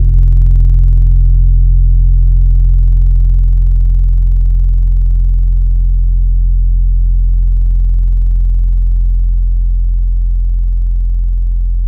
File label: Keys Rhodes Classic; Synthetic's Serum Collection; ESW X Keys